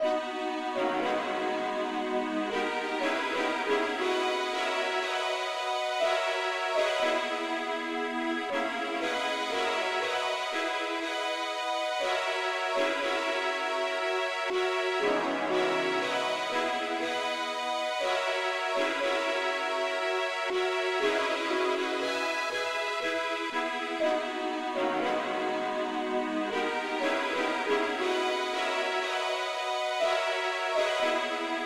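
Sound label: lead keyboard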